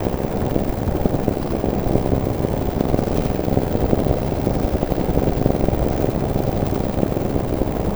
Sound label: Drone Ambient; Drums & Drones